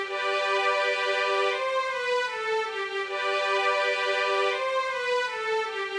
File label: strings